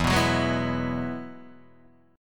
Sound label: E Major Add 9th E down Guitar Open D